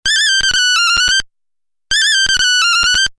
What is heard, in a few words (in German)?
gartengrasmuecke, Vögel; midi; Polyfonisk musik; siemens